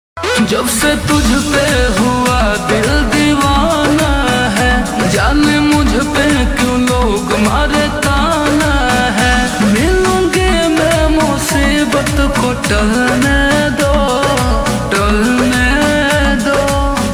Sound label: Ringtone slowed reverb